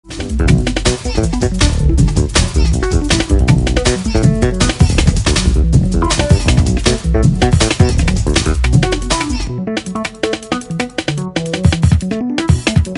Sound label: Drum And Bass